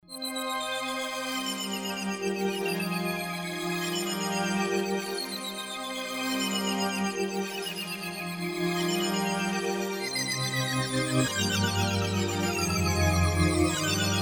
pad pan, Yamaha TG-500

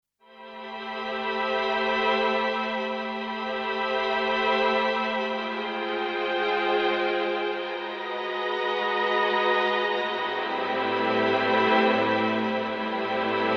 pad slow mellotron, Roland dj70

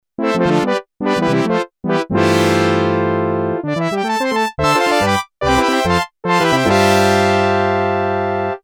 brass, Kawai sx240

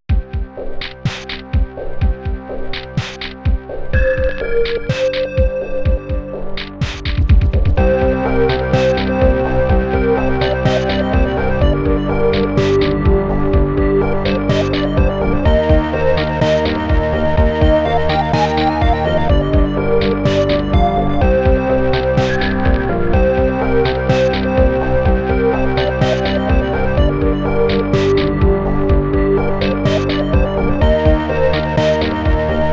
echoing mod; Commodore Amiga; famous Module Echoing; MUSIC TRACKER

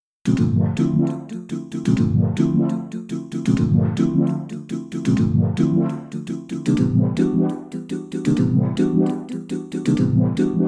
voice doh, roland jv880

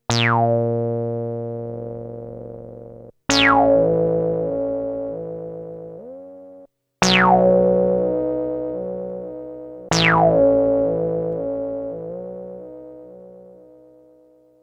ring, novation super bass station; test: ring modulator; analog monophonic module based on subtractive synthesis

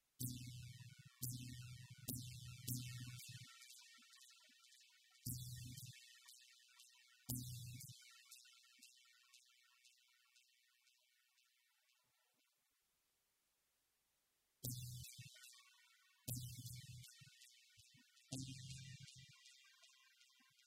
delay, GEM S2

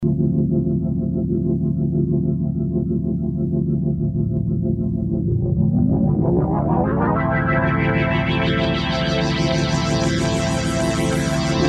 pad-wash, Kawai sx240

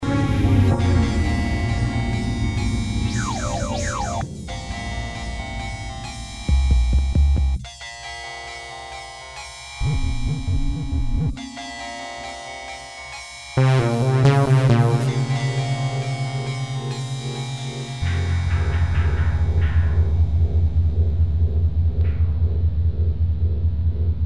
synth; Emu XL1 Xtreme Lead; multipart link patch

